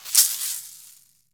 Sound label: caxixi; AKAI S-Series CD-ROM Sound Library VOL-5